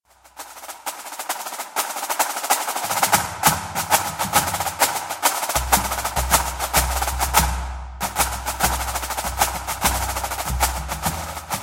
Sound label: Drum Salute